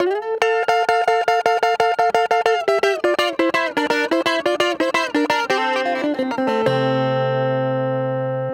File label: Ac 12 Str Gtr F free, Twelve String Guitar, African Guitars; Quazibeat; African Secret